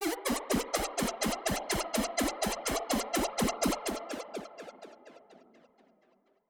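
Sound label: Modulation Processed, alarm siren samples 125bpm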